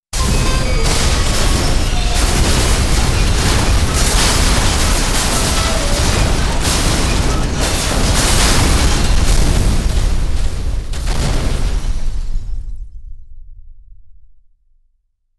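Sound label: Metro train crash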